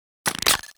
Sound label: Briefcase Launcher Rearm; Warframe; Weapons; Corpus